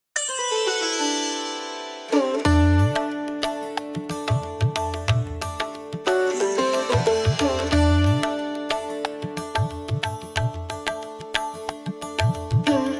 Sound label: indian music; independence day greetings